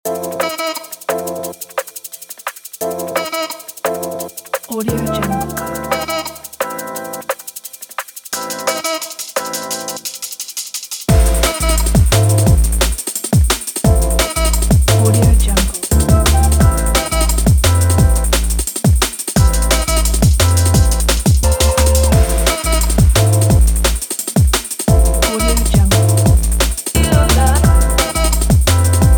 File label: fashion music